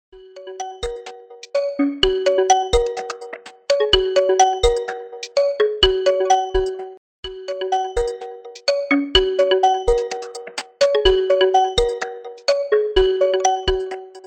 Vivo iQOO Neo9 Ringtone

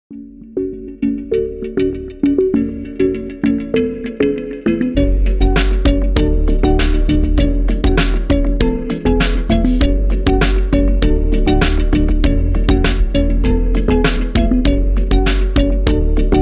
Ringtone Whatsapp Vivo

